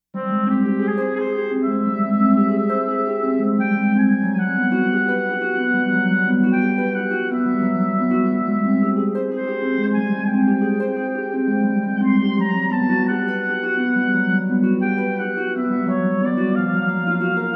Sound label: Harp Oboe